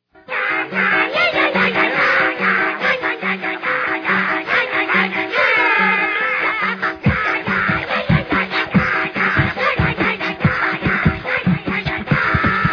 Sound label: Beatbox Sax Intro; MarsCon 2009